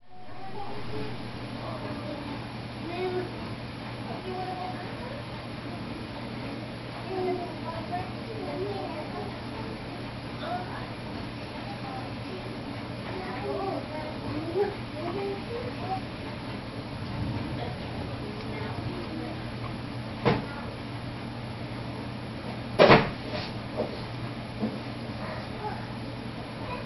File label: fire wood